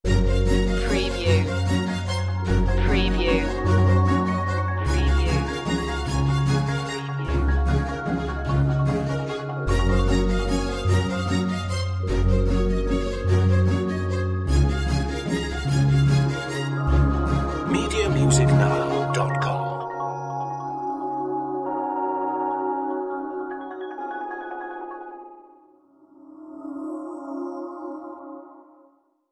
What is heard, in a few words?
no glitches